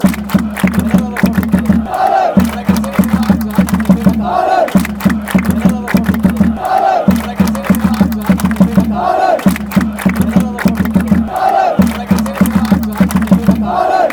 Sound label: clap, chants; ahlen